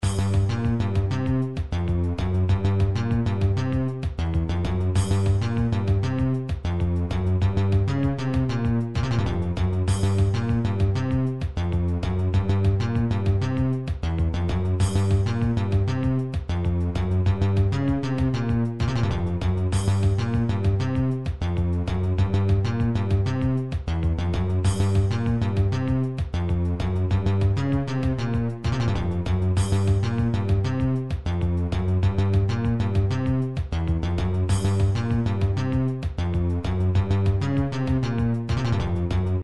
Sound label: beat remix; nintendo 64 mario party 3 battle room